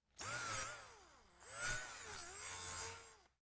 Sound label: drill motor